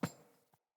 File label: Boot Walk Metal, Footsteps; Enemy; Sinecure; Melee Grunt; Boots